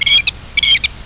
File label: Bluebird short chirping sound effect